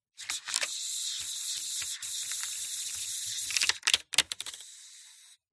camera film 35mm slr canon a1 film rewinding roll canister; mkh8060; PMSFX